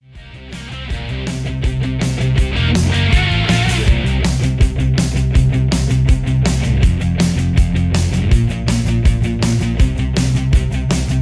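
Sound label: Key C, Karaoke Backing Track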